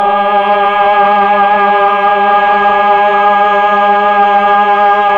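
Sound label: voice Mellotron choir; Roland LCDP09 Keys of the 60s and 70s 1; CMB Melo.Combo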